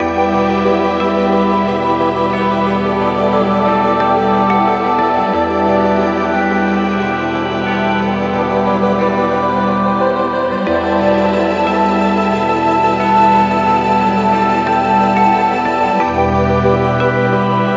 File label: Classical